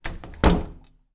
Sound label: household door internal wooden close med old; zapsplat